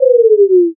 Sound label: debuff